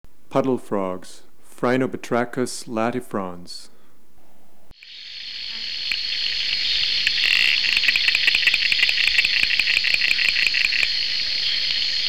Phrynobatrachus latifrons

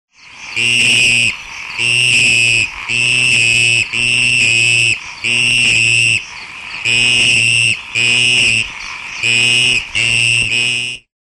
Scaphiophryne brevis

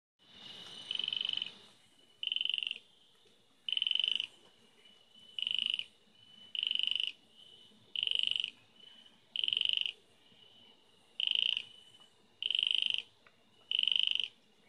Parapelophryne scalpta

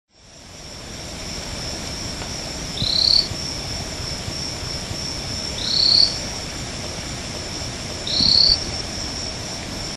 Boophis jaegeri

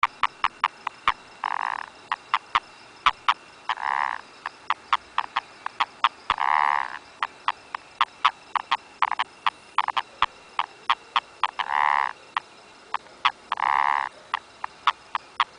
Rhinella ornata

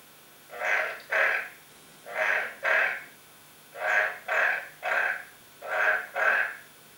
Smilisca phaeota

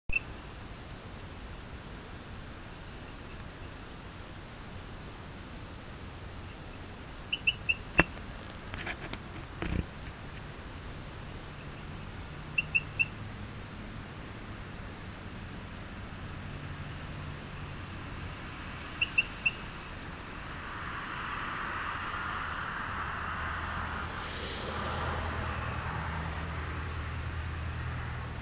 Eleutherodactylus cystignathoides

